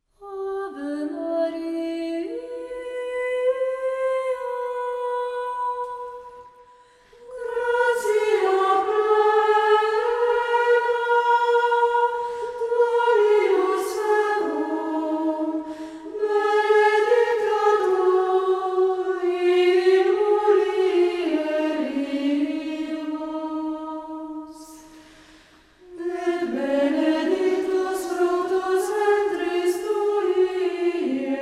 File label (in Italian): Antifona Gregoriano; Ave Maria